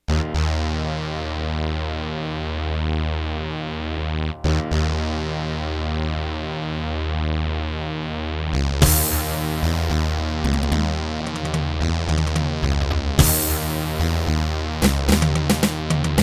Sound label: Terratec MiniWaveSystem SOWT-11